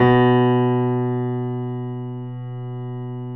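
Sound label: grand piano B 1; USB Soundscan; AKAI; Keyboards Acoustic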